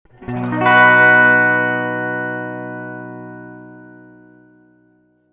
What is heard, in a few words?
reverb tank 3 spring short sustained chord